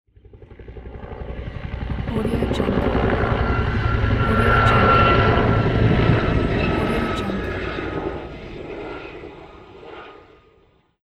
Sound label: helicopter pass by